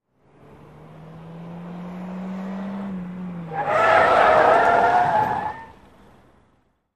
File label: Sm Car 180 Skid, compact